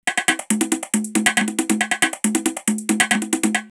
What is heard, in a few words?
Kif Kif 138, drumloops DrumMachines; X-Static Goldmine